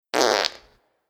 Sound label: Farts; Shrek